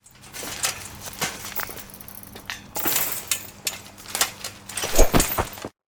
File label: prison fence vault